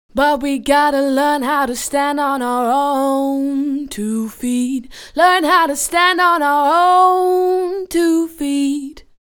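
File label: dance vocals 120 Bm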